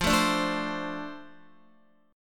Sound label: F 6th F down Guitar; F6 strummed